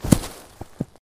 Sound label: effect fall to grass; lucius